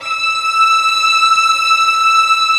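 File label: Vio legato E5; Digital Sound Factory Virtuoso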